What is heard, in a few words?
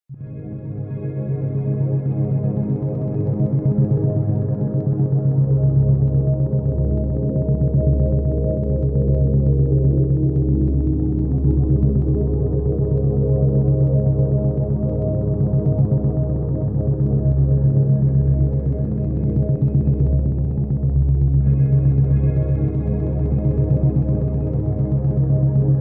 Music for Plants - Music Stimulation for PLANT HEALTH - Brainwave Entrainment